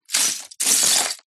leisure lego pile movement

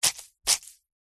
foley wet cloth squeeze dab squelch

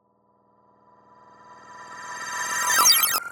Computer SFX long; soundbits

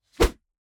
Swoosh Rod Pole; Just Whoosh 3; soundbits

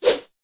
sport tennis racket swing swoosh whoosh air; zapsplat

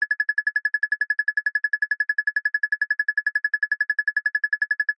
game tone toggle scroll

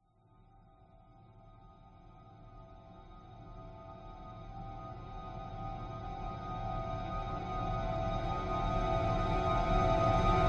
horror riser long shrill airy

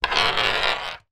marble single roll across table

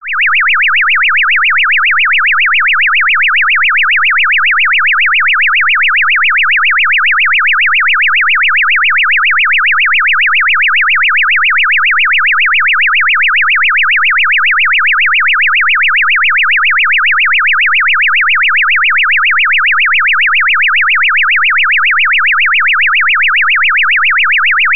emergency siren car alarm designed; zapsplat

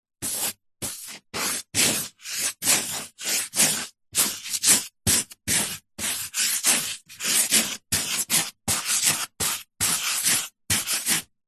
office chalk writing on chalkboard; zapsplat

